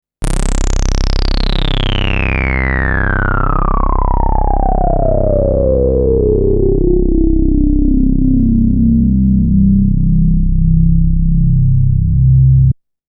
VCF in max reso D

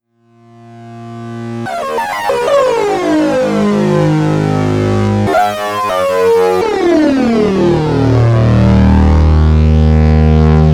Korg Prologue Screaming Sync

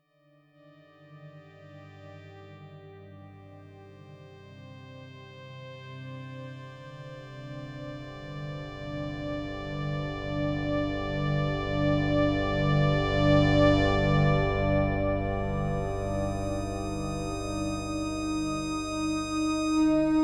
Kawai K3 Live Editing

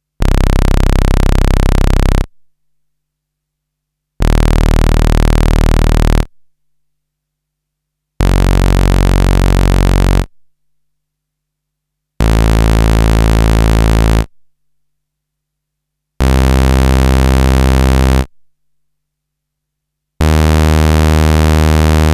Saw C

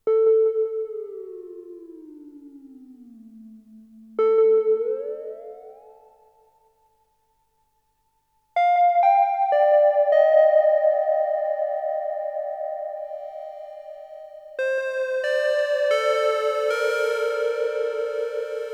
Modal Argon8 Solo

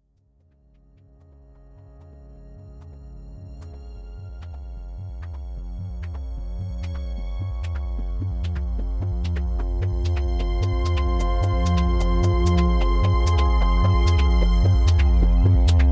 JD800 mix

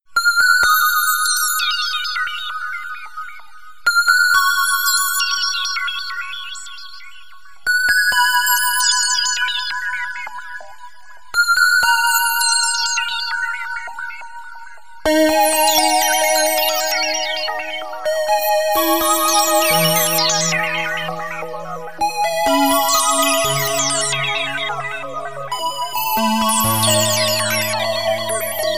Juno-60 Filter Resonance

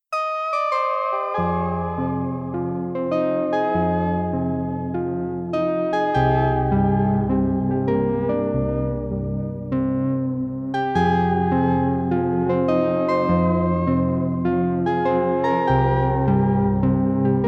Expressive Solo, synthesizer

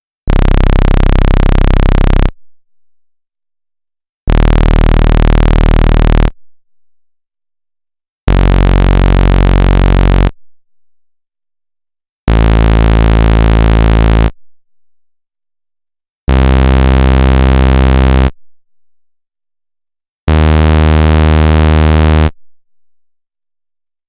Saw

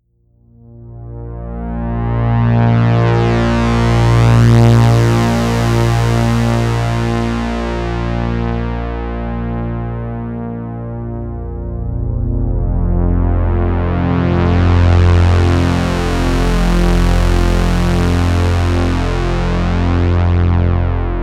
Summit Brass

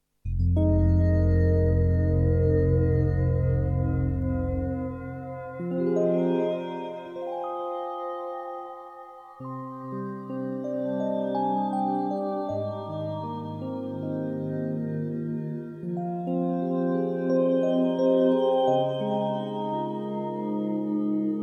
s3 atmosphere

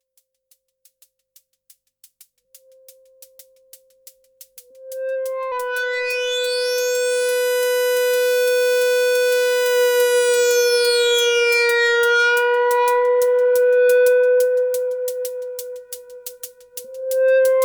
Boomstar 4075 Overdrive

